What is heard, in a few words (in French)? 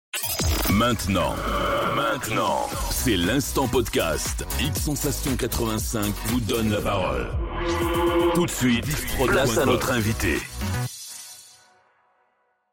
Jingle Podcast invite court; Hit Sensation 85